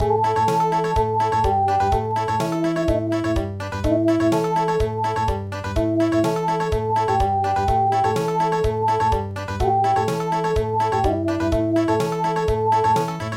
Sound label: Country